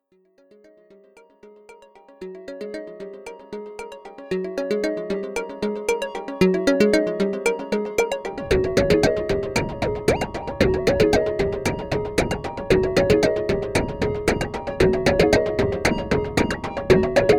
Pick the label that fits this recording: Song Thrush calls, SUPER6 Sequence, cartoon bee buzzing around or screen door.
SUPER6 Sequence